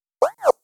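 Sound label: Drip ricochet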